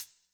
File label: hihat; pasific; Pop